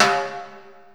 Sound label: timbale hi, Percussion; realdrum